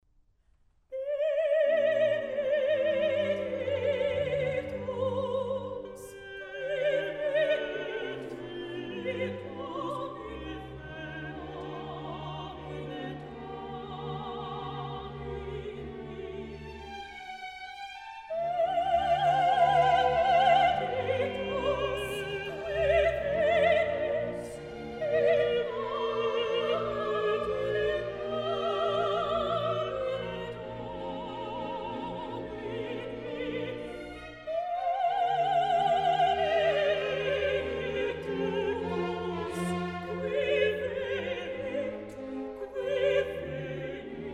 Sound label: Missa brevis in D min, Benedictus; Mozart; Missae and Requiem; Davis, Gardiner